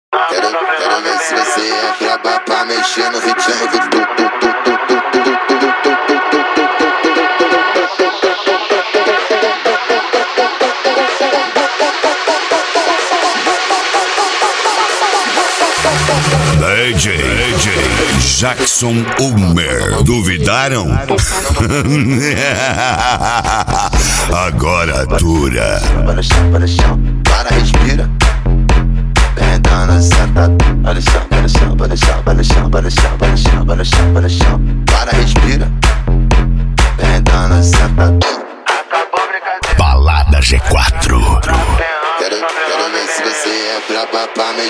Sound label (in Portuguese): eletro funk; balada g4